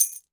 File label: Coin Bag Ring Gemstone Item